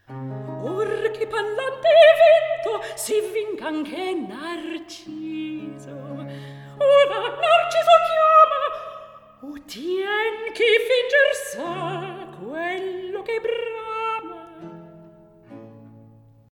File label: Recitativo; Or Che Pallante E Vinto